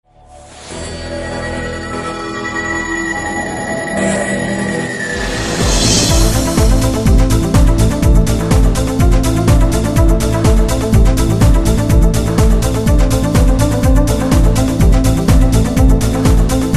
主题; 创维移动+vika 音乐与声效

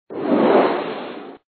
闪; 创维移动+vika 音乐与声效

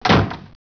关门; 日常生活; 特酷声音素材库

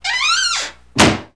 开门关门; 日常生活; 特酷声音素材库

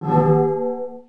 启动; Win98; 黄金时代

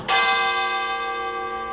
钟声; 日常生活; 特酷声音素材库

